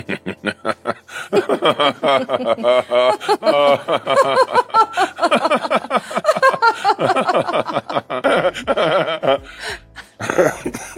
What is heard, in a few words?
motionless laughter; audio meme